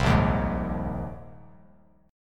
A sharp 7th Suspended 2nd Suspended 4th, Bass Standard; strummed; JGuitar